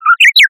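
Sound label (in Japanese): uguisu, warbler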